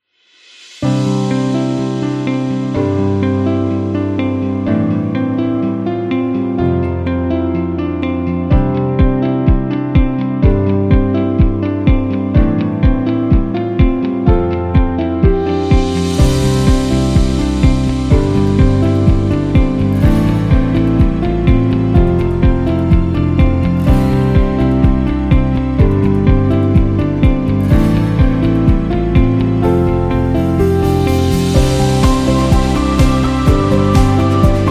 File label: Business Growth